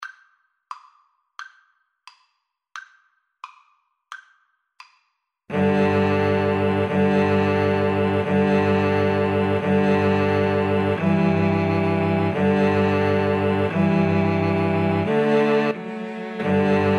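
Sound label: scotland the brave violin violoncello, Violin Cello Duet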